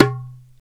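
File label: perc darabuka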